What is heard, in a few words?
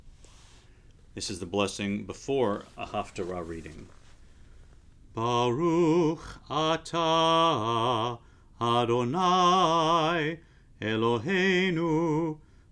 Blessing Before Haftarah, jewish prayers